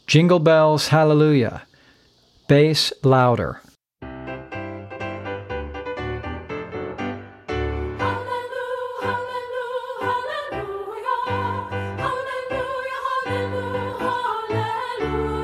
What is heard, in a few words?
JINGLE BELLS HALLELUJAH BASS LOUDER; practice tracks for singers